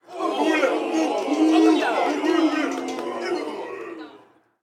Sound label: Street Ball Crowd Boo; SFX Kit; Crowds